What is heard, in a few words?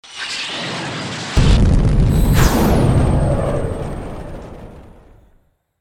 boost Rocket